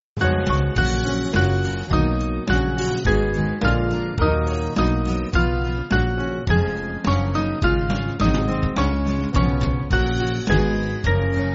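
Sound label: Band, Music Backing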